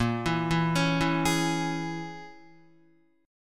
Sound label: Bb Minor 7th Flat 5th, Guitar; Bbm7b5 arpeggiated